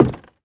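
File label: player wood, footsteps; csgom; cstrike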